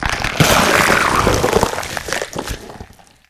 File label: Cactus Rager Death